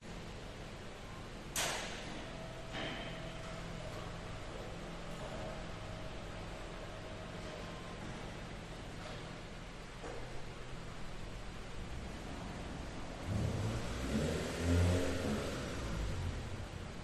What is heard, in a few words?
auto repair shop atmosphere car engine idling hood and door sounds; rhythmic; Sound Ex Machina